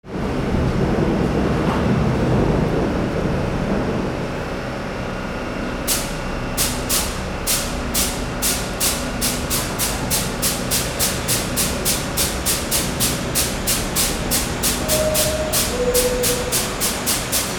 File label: VEHICLES